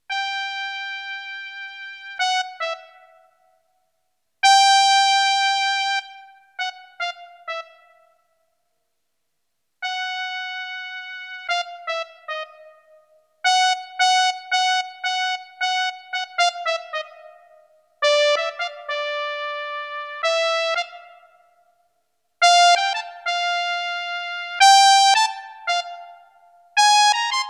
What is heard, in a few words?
Jazz